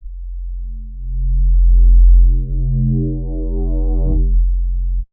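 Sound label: bass sustain creep F; Polyend; ARC Noise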